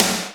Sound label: JD800 Dance Card Drums